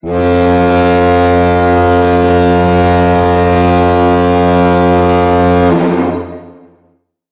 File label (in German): Sirene